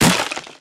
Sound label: mined lichen tree, mining; ashland; Factorio